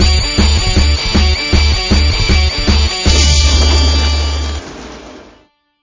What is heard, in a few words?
Game Win; ben 10 battle ready online gamerip 2006